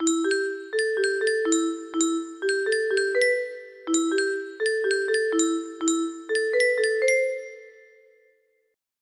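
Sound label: music box melody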